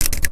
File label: indoor